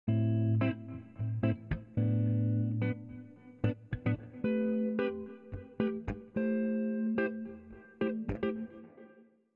electric guitar tube delay wide

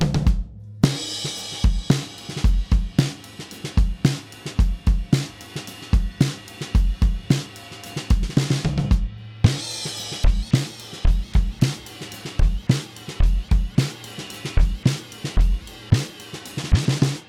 envelope follower

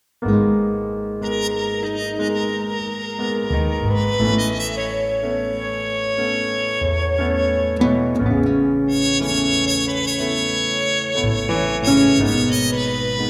voyage in blue - trumpet

